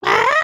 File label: chicken cluck long; emotes